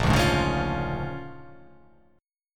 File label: A sharp 7th Sharp 9th